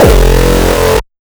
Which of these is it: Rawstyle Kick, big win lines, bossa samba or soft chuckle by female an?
Rawstyle Kick